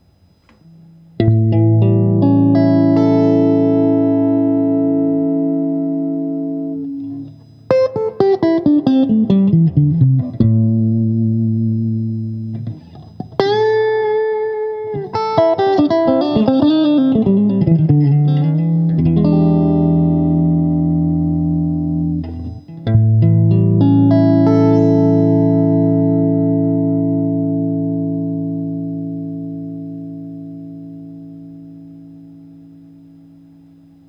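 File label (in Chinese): 60S OCC 单晶铜 清音 2档, acoustic guitar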